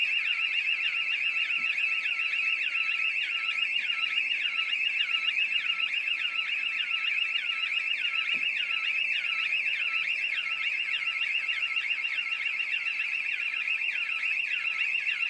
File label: the sound of a neighbourhood s burglar alarms following a powercut